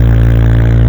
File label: Car Low Loop